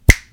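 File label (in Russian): простой щелчок пальцами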